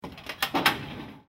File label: file cabinet opening